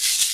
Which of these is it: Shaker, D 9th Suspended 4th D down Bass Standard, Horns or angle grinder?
Shaker